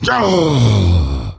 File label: wound crit; Moorabi